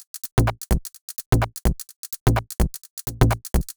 Top A 127bpm, House Drumloops; 90 s Sample CDs; Zero-G House Fabrik Drumloops; ZG Drumloops

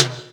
TIMBALE, Hip Hop Misc Perc; E-MU Producer Series Vol. 8 – Platinum Phatt

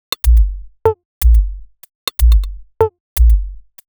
Top 123bpm, House Drumloops; Zero-G House Fabrik Drumloops

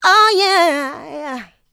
Vocals, Adlibs; Houseworx

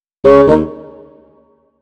Bass Clarinet, Cinematic Funk; Sonic Foundry (Sony Creative Software); Cinematic Funk Urban Tone Poems

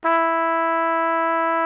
trumpet E3; Greytsounds VOL-1

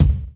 BD; Best Service; Dance Mega Drums

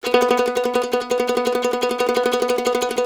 CEE TRILL B L, Blues Guitar

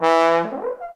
TROM DOIKS

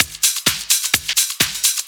Clubhouse Loop 128 BPM

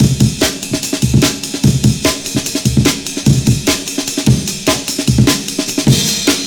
AMEN, breakcore breakz

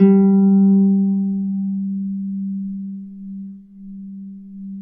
G2 HARP; USB Soundscan vol.62; AKAI

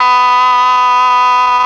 OBOE; ILIO; Propeller Island Legendary M400; CHOR+OBOE

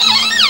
VIO MISC, MISC VIOLINS

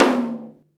DM5 Perc, Alesis DM5 drum machine

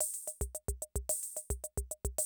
CR78, DrumLoops

